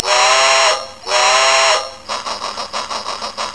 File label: train